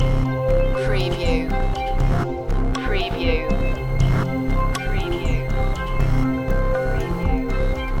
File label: steam rhythm loop